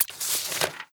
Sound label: docs next